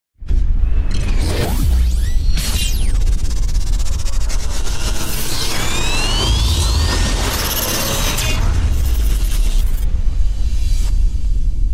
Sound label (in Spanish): Sonido intro futurista; tecnologia; futuristas